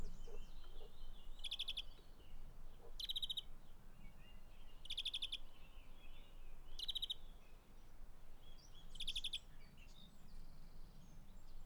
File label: Carduelis chloris; Bonrepos